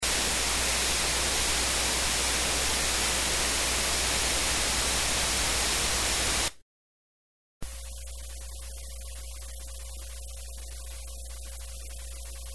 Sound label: line mic input 30dB